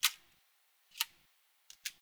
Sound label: Computer Room Floppy Disc; Warframe; Ambience; Nineteen Ninety Nine